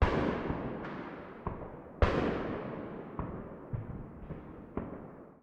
Explosions

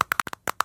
Bones-Cracks, bones cracks; Friday the 13th: Killer Puzzle

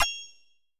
Coin

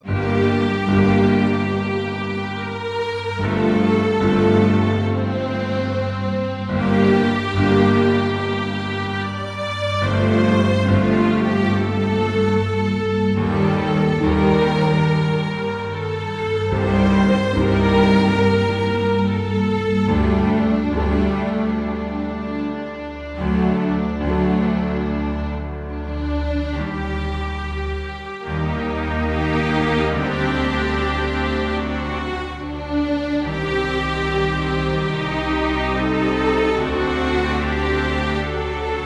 BGM Event Defeat Sorrow; Neo Atlas 1469; PS Vita switch windows

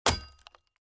sword Wood; out of reach treasure royale 2020 gamerip pc

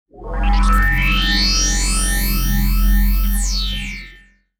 Teleport In; Worms Ultimate Mayhem

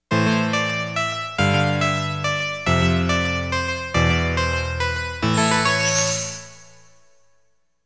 GAME OVER; Konami Game Music Collection

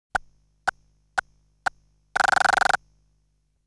Text display sound

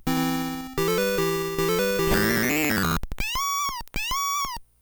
jingle ~ level clear

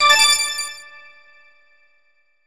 Silver Coin; Diddy Kong Racing HD; N64

